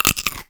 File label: Crunch, cartoon Foley Fx; musicradar; cartoon caper